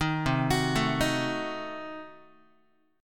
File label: C Diminished 7th down Guitar; arpeggiated; JGuitar